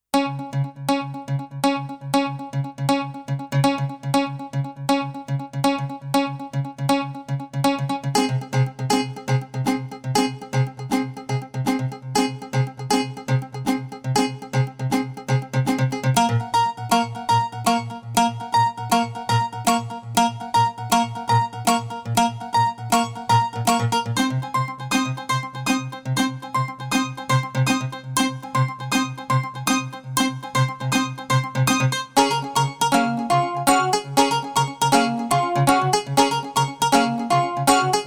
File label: minimal